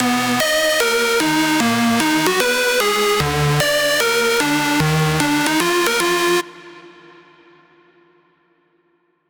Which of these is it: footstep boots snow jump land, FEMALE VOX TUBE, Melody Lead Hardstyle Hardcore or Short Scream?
Melody Lead Hardstyle Hardcore